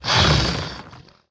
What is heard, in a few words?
plant troops damage, monster